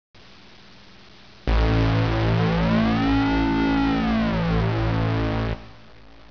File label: stretch